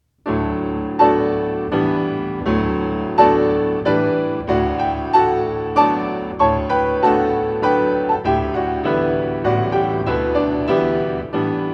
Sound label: pianist; Sing Praise to God